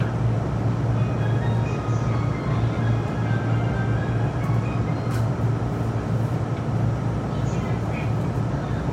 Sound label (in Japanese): 京葉線検見川浜東, train sounds